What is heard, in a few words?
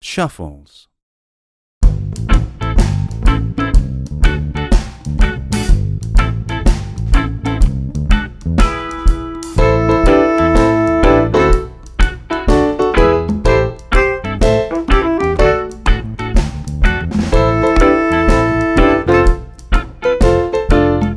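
Shuffles, Tap; Ballet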